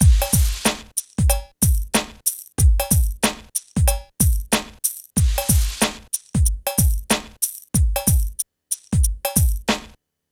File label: PHATT BEA